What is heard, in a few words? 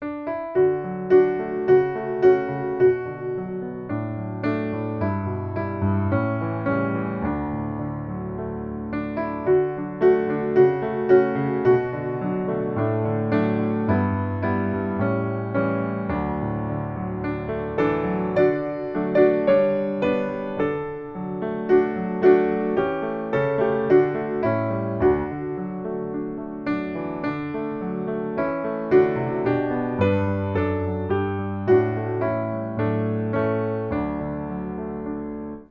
piano